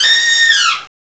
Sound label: ELEPHANT; CMI Sound Library Rev 1.3